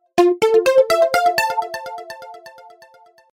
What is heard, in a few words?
Message